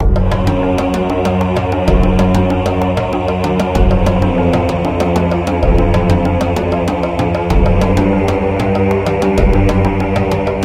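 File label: Boss Battle, MIDI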